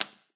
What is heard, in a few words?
first Chip, roulette